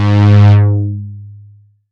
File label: Release G#2; Foundation Analog Synths; Ableton Foundation FreePacks